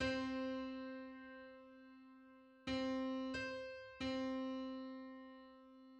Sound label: Two-hundred-fifty-fifth harmonic on C